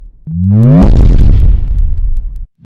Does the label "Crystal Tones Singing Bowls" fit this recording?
No